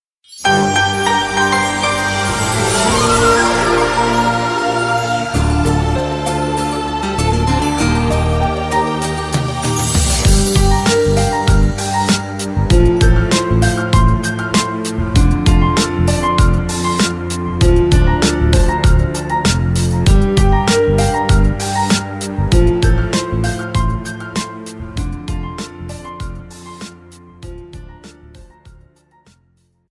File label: Intro Jingle; Value Retail